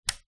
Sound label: household wall socket switch on; zapsplat